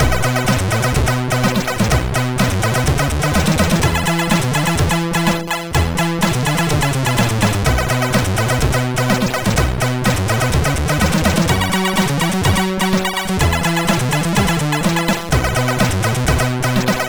Rock n Roll, sid MOS6581R2

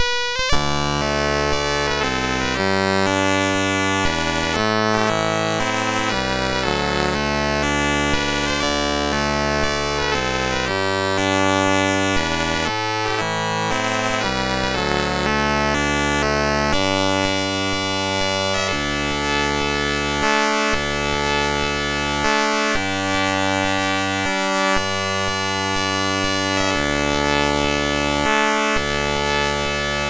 sid, MOS6581R3

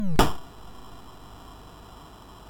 sid MOS6581R2, GAMES; Face Off

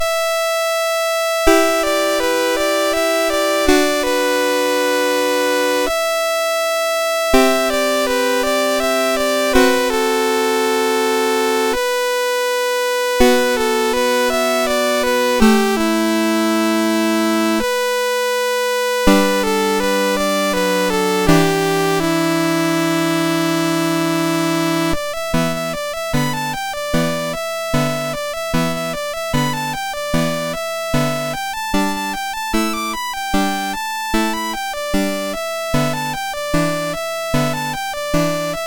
Evening in the Country, MOS6581R2; David W Tattam

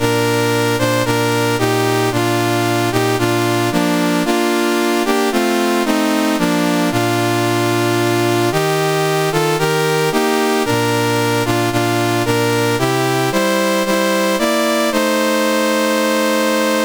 National Anthems, CSG8580R5; Fiji; Dave Marquis

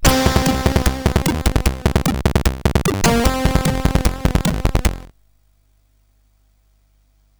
sid, CSG8580R5; Gunfright

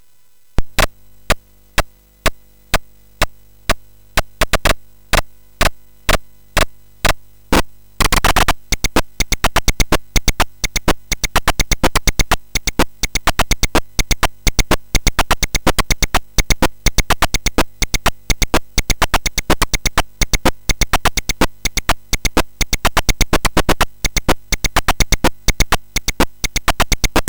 Mixes sid MOS6581R4

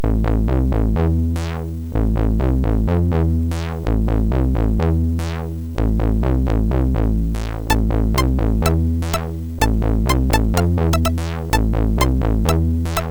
Action Drums, MOS6581; sid

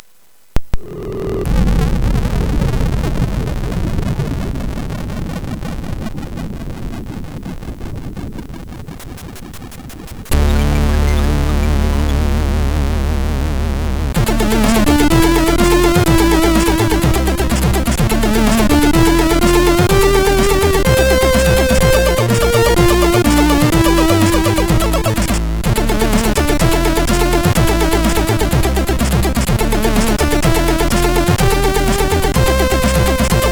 sid, MOS6581R4

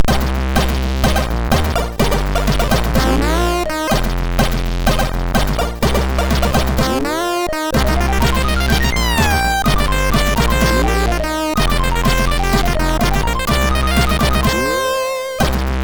Farting, MOS6581

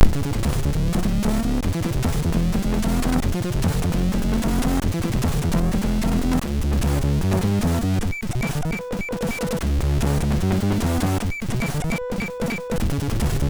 Test, MOS6581; hvsc

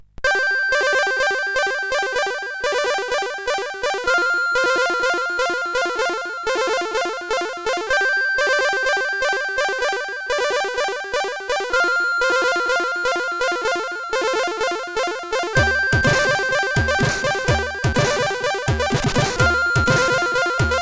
Sounds Like Wolf, sid CSG8580R5

